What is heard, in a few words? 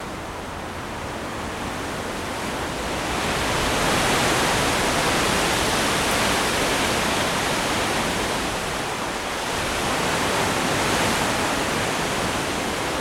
Wind